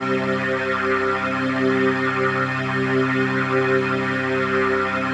PHASE PAD; Best Service; XX Large Pads; Sample CDs

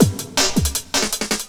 LOOP, 160 BPM, Drum & Bass

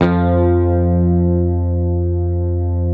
Hamer 12, SUS, F2, Bass; Sampleheads; Will Lee Bass Library

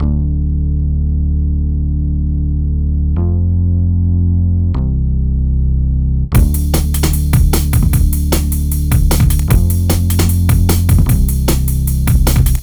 Hardcore Breakbeat, 152 Dmin; Big Fish Audio; Hardcore Breakbeat Action

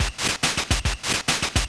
Cyclic, Drums Beats

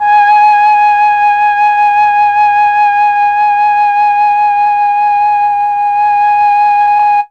flute; ILIO; Propeller Island Legendary M400

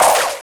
Thunder; Raiden Densetsu; Fighting

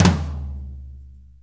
Blues Tom Flam; Sonic Foundry (Sony Creative Software); Drum Tools; Flams

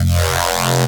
Reese Bass F; Antidote Advent

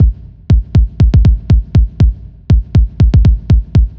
Kick, Trance

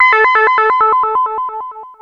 ARP 2600 C3, synthesizer; Hollywood Edge - Giorgio Moroder Rare Synthesizer Collection

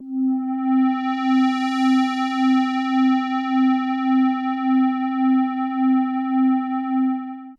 juno, Juno-60

